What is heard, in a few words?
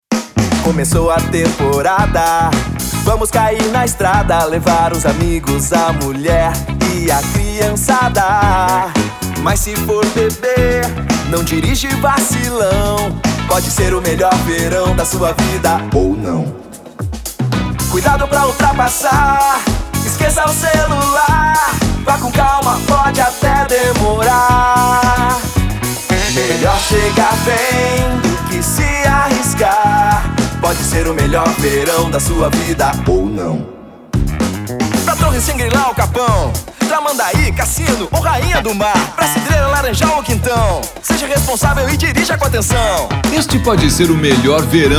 daer jingle